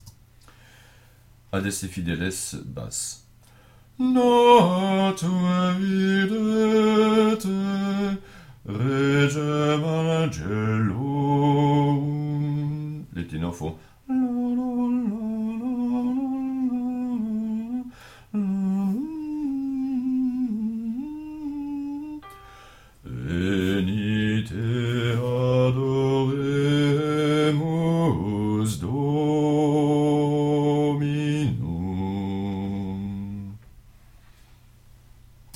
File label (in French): Basse; Adeste fideles